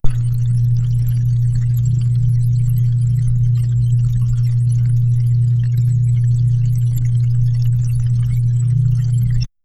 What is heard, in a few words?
the sound of liquid glass, appearing